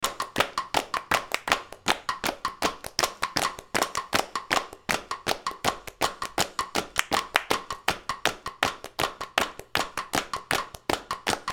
BEAT HAND CLAP, Beat Loop Hand Claps Feet Stomps Up Tempo